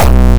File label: Distortion Kicks, Bassdrums